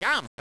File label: jump